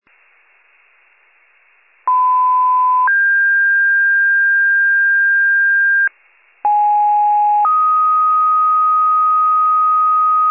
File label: FIRE pager alert